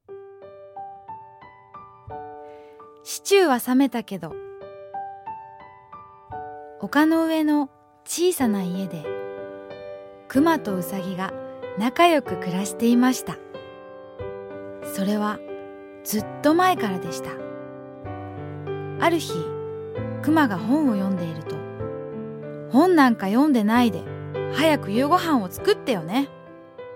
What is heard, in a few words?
BGM